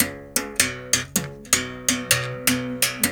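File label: 79 bass berimbau phrase; Roland LCDP11 Africa VOL-1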